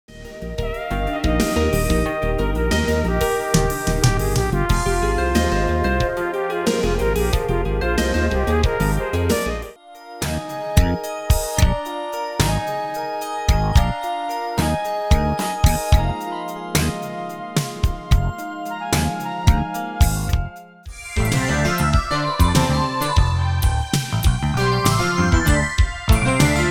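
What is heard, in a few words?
Totaal Cool Grooves, music on hold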